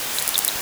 pond

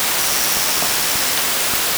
pond

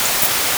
pond

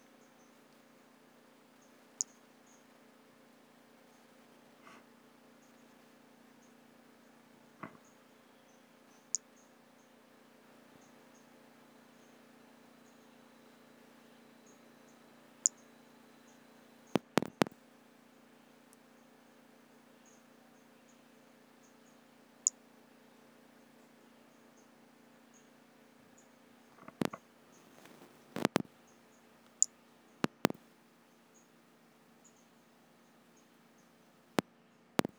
Brachycephalus albolineatus; unvouchered

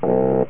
Adult Social Sound

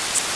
pond

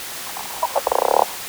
pond

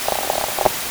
pond

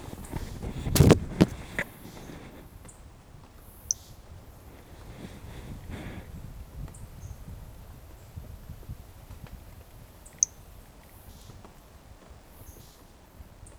Brachycephalus mirissimus fist note missing; L F Ribeiro

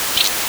pond

